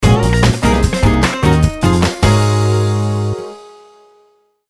Eagle Jingle; Fun! Fun! Minigolf; 3DS; Wii

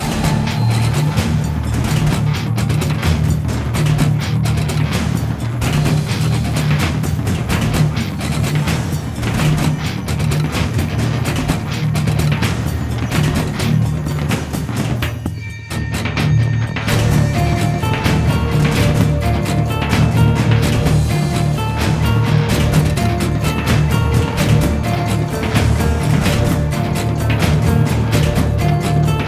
Wetlands Escape; assassins creed ii

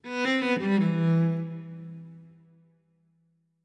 Music Level Argument Stinger; Krish

